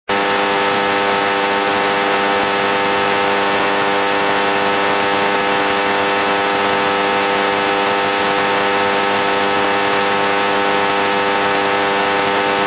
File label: PSU RFI AM 6kHz BW